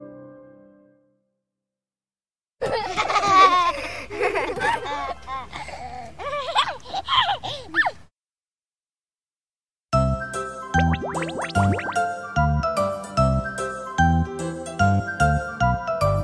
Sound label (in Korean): 영어 여 동요; Mary Had A Little Lamb